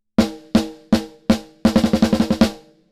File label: snare bypass